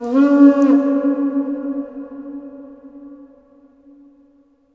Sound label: HRNPHR, Heart of Africa; AMB. HRN FX